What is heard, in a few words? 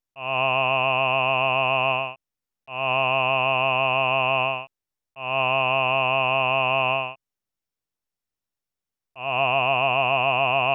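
Vibrato 765Hz half tone Semit Onsets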